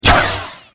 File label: saber hit